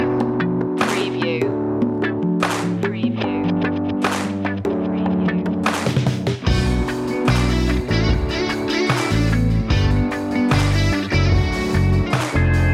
guitar lead

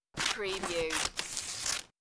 Paper Cutting With Scissor

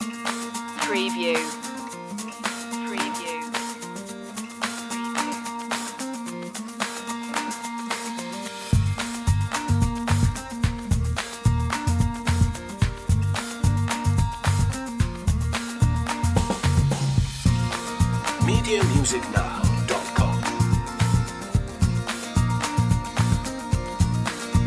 loop

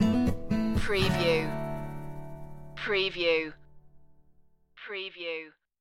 morning light stinger

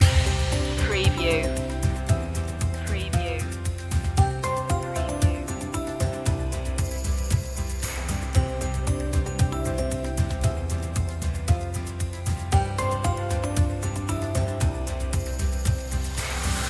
time with you loop

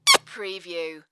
Quick Squeal Kiss

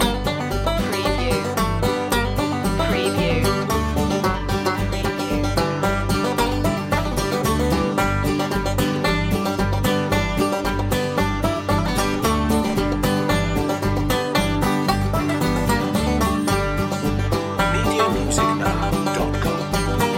back porch stomp loop

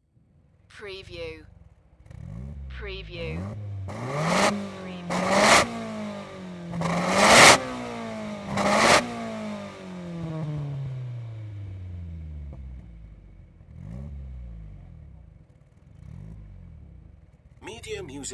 NISSAN SILVIA EXHAUST MULTIPLE REVS

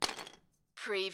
Lego, single lego piece drop onto pile of pieces

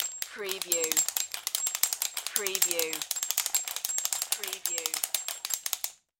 Dual Ratchet Turn; Sound Effects Bible

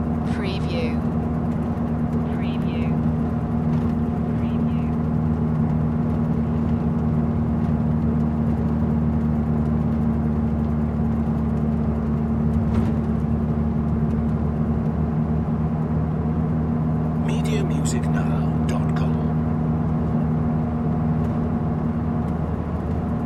travel bus ride loop

